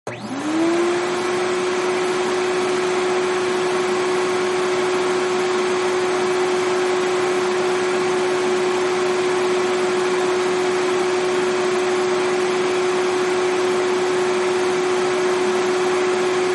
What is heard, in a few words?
Vacuum Cleaner Sound White Noise Sound; Vacuum Cleaner Sound 1 Hours; vacuum cleaner sound for babies 3 hours